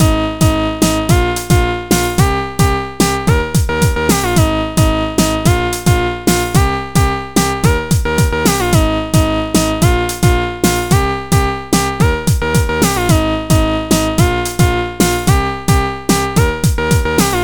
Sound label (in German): Oszillator Dancehall